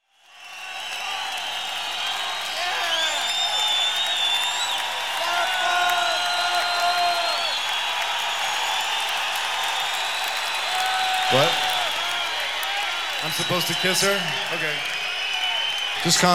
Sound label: NYC Halloween Audience